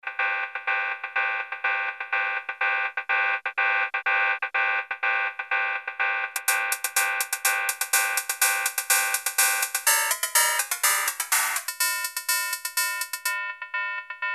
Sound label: MODOR DR2 CRASH CYMBAL